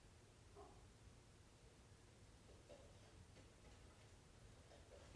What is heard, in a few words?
Dog Bark and Trot